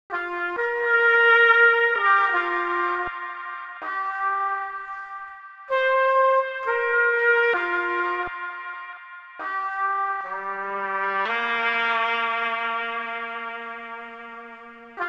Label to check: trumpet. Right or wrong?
Right